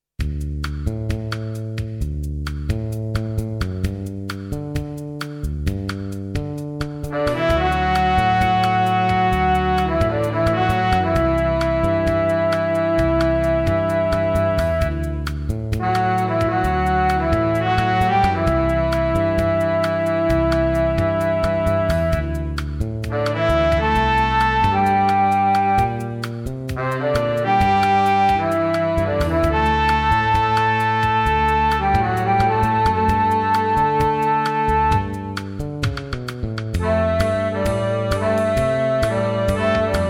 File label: cafe minus pno